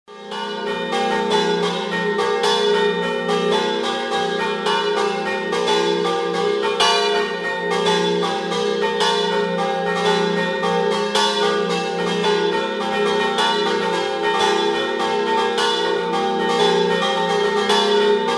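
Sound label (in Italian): joannis scampanottata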